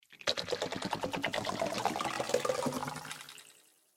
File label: Water Space Bubbles, sci fi; Andrew Fleming